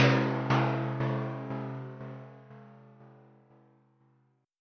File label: ship destroyed to pieces; DanmakuProject